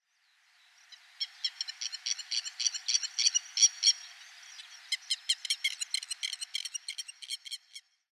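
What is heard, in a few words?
keri, Grey-headed Lapwing; himeji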